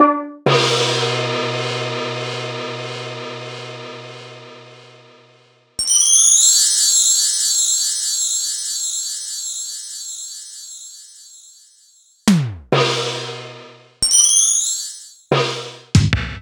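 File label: boss drum pad; Sliced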